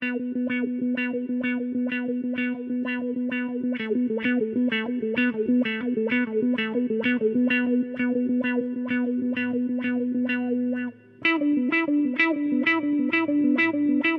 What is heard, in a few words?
Polyrhythm Exercise